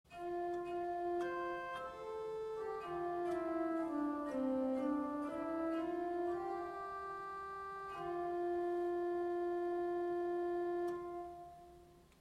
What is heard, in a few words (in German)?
Viola di gamba 8